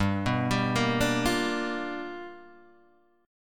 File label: G 7th Sharp 9th G chord, Guitar Open G